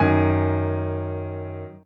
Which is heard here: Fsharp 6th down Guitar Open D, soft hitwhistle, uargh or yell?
soft hitwhistle